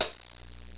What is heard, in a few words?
CYMBBELL; MUSIC